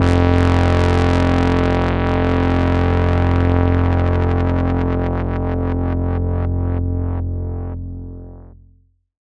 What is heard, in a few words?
bass F1, EMS VCS3; Polyend; Simulacrum Sounds; 1 Shots; Synths